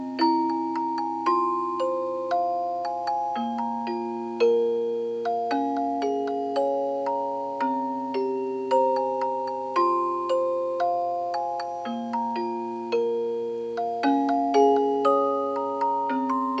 music box

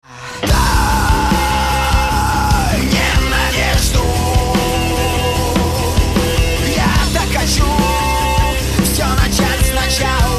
gong

